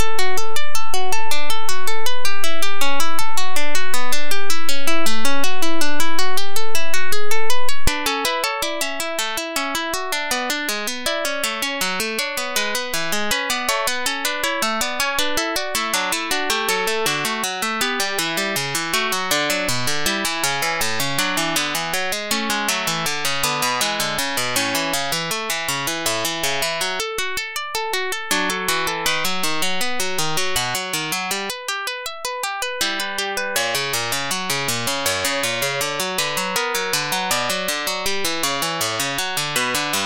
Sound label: fughetta, electric duet